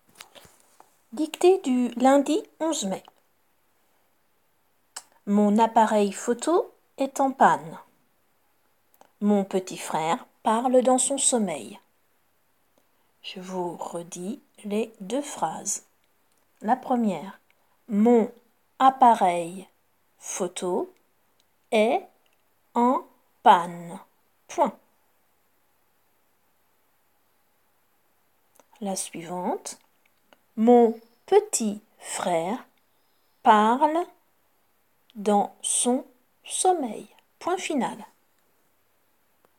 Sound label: Vocal